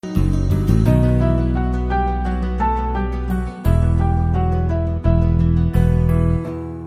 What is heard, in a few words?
love bgm ringtone; tamil; psycho